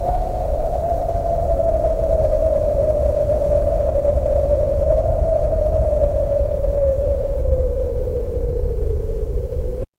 ambience wind

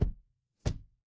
ui drag

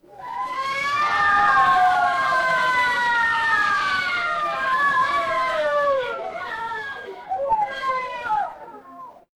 crowd cheer

